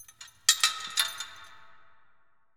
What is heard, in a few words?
Cave chains; 100rogues